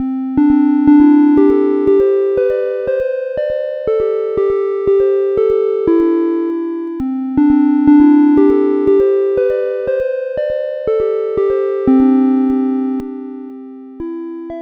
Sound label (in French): harpe